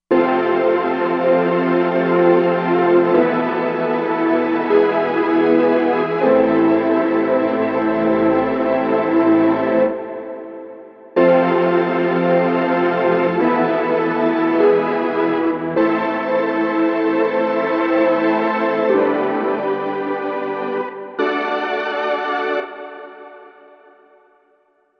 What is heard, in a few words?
Moody Tron, Roland Fantom XR